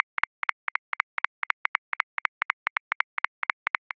Hip Hop Roots Down 16ths 80 bpm; Grooves; Ableton Live 12 Lite; Core Library

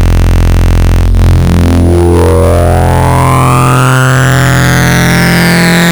Vector Sprite Complex Bitten Sync; Ableton Live 12 Lite; Sprites

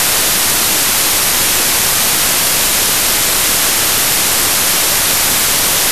White Noise; Ableton Live 12 Lite; Vector; Sprites

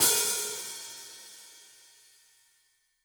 Hihat Open Brush Club Sizzle

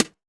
Rim, Drums; One Shots; Tangerine

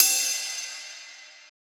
Ride Stick Tight; Drums

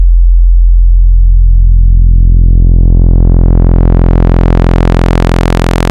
Vector Sprite Basics Sub; Ableton Live 12 Lite; Sprites

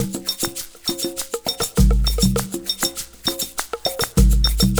Perc Semba 100 bpm; Loops; Drums; Percussion; Ableton Live 12 Lite; Core Library

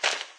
foot walk; There; ThereClient

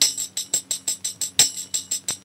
Tambourine Sampled 86 bpm; Loops; Drums; Percussion; Ableton Live 12 Lite; Core Library

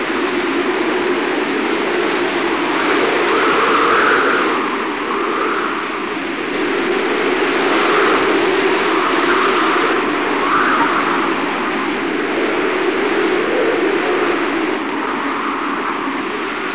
wind; Active Worlds

